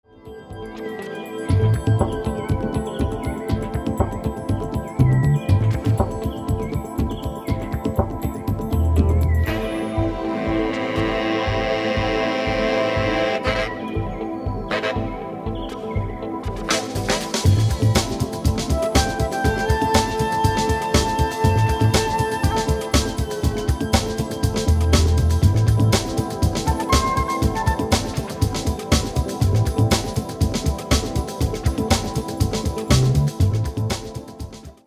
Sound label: Jazzy